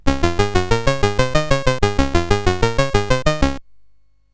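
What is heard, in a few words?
Moon Patrol, MOS 8580 SID chip music, games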